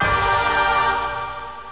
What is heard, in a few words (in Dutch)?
Dah, hisses pingen woesjes tikjes; Zoemtonen hisses pingen woesjes tikjes